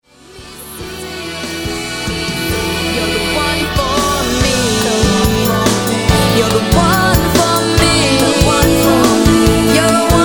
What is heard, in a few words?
Variety Band; Lexington Aire